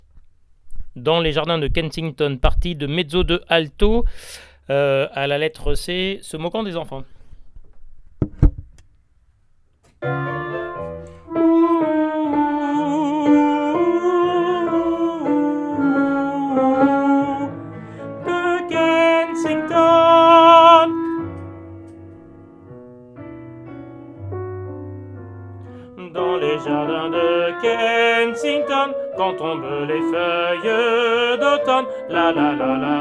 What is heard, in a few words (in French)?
Dans les Jardins Alto